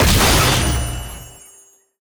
enemy boss counter attack attack; bloom minigame

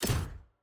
UI Menu Generic Back

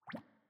Bubble Small Blow

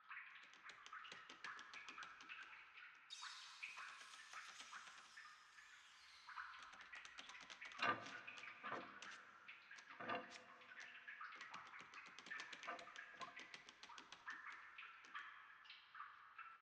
Sewer

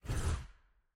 UI Menu Resume Click

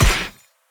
attack light; bloom minigame

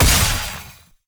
attack light; bloom minigame

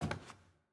Footstep Basement Wood

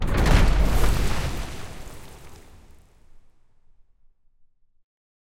courtyard furniture lever

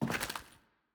Footstep Glass

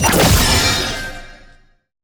attack dash heavy; bloom minigame

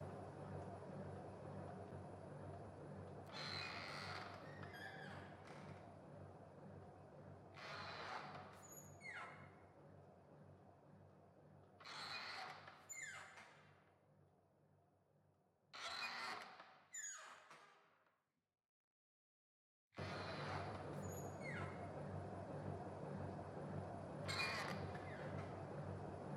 Ceiling Hole

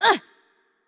Hurt Light; VO; bloom minigame

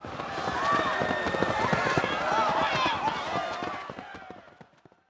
Crowd Running; bloom minigame

